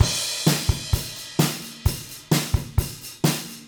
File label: Beat Loops; Zero-G; Monster Beats